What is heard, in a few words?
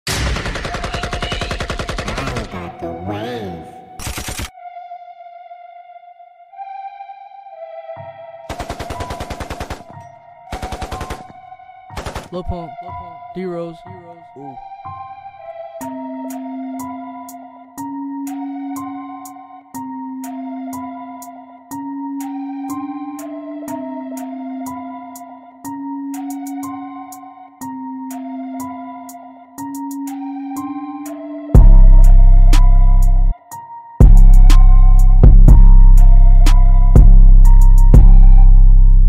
Instrumental